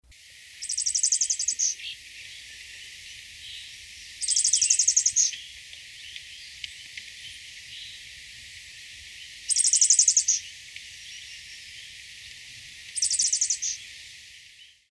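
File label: Payador Canela, Daiglossa sittoides, Rusty Flower-piercer; cantos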